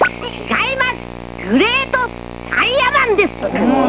Wrong

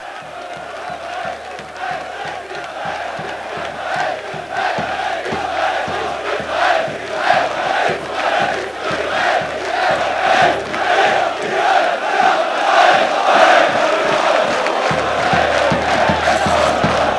APPLAUSE

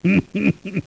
laugh; Hanzo laughing; Samurai Shodown

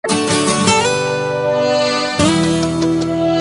melody

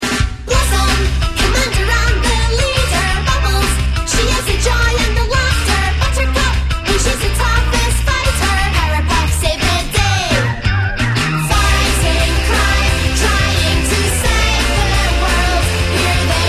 midi closing

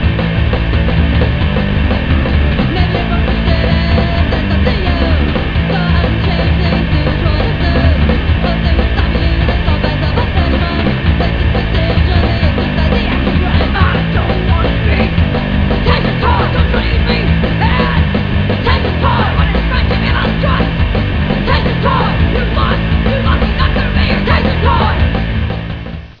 tension

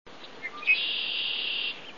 blackbird red winged

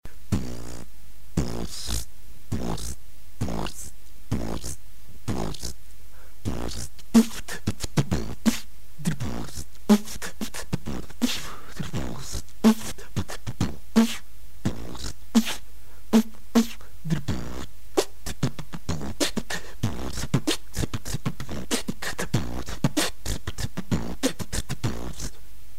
drum

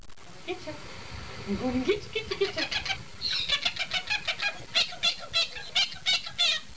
tickle

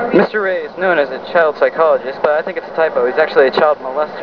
child

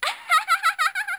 laugh; SFZ2 laugh; Street Fighter; chunli

